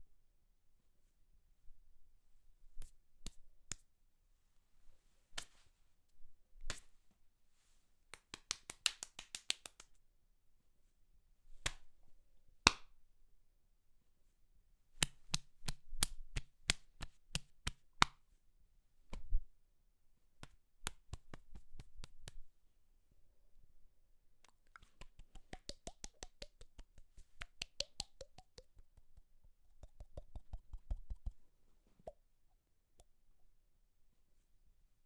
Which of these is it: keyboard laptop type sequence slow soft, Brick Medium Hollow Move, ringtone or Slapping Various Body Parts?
Slapping Various Body Parts